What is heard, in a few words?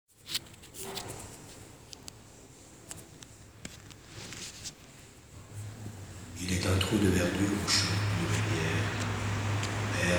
Vocal